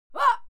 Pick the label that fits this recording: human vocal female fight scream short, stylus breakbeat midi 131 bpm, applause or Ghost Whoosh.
human vocal female fight scream short